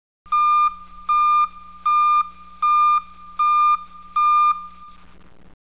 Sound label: Alarms, audible signal tones; Vehicular Back-up Alarm